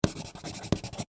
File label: Office Pencil Write On Cardboard; Writing; Human